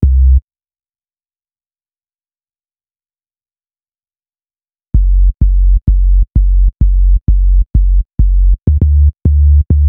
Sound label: Bass, Hip-Hop